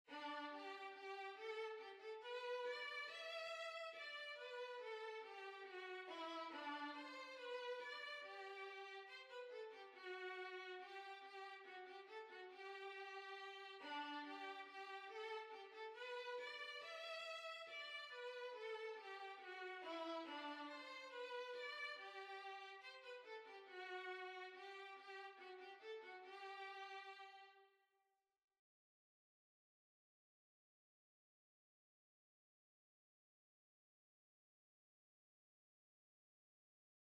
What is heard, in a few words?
Violin